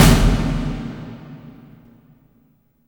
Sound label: DEATH MARC, AMBIENT DRMS; Spectrasonic Distorted Reality 1